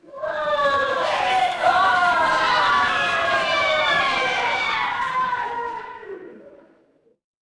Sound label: crowd cheer